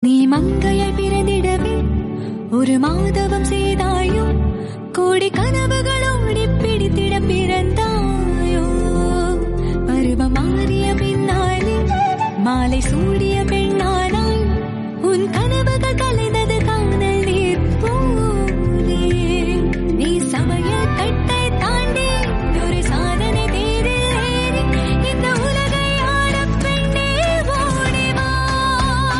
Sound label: Tamil Song